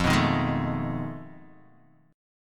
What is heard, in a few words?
F 7th Sharp 9th Flat 5th, Guitar, DADGAD